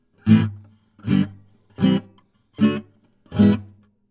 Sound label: Strumming